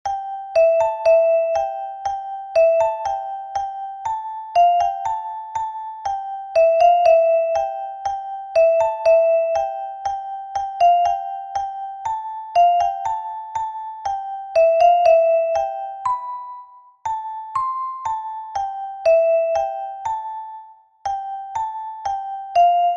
Metalofono Soprano

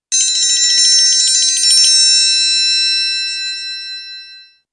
triangulo

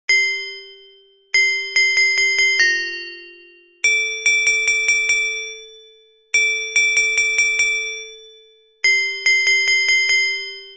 Xota Carillon Soprano

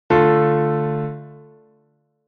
interrogacion; Cadencia Plagal; armadura 1 bemol, interrogación e un acorde: re-la-fa-la

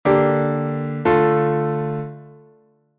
plagal buena; armadura 1 bemol, dous acordes: re-si-re-sol; re-la-fa-la

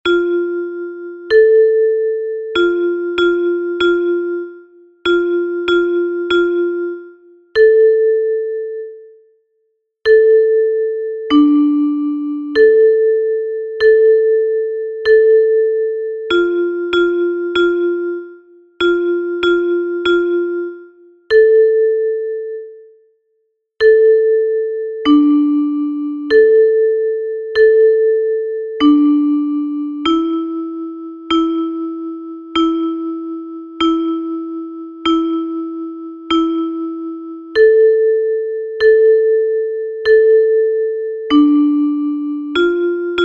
Metalofono Contralto; Samain

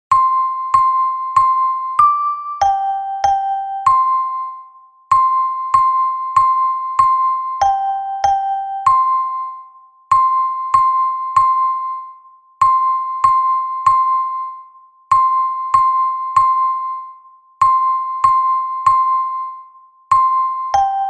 Metalofono Soprano; SAN XOAN; UNHA PEZA PARA CADA FESTA

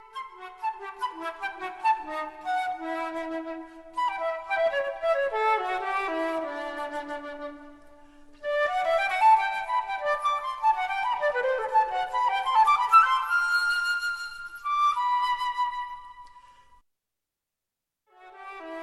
flauta travesera

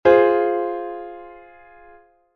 fa-lab-do

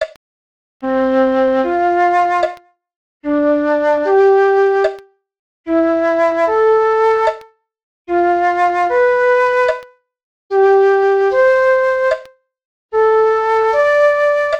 cuartas contratempo

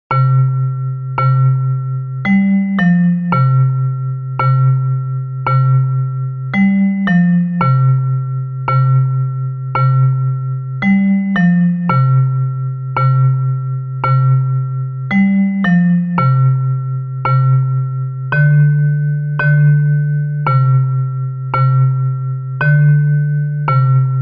Muineira de Lugo Metalofono Baixo

